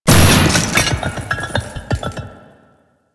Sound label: Loot Crate Large; Warframe; Entrati